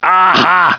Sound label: AHHA